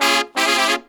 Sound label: Phantom Horns, 132BPM; Zero-G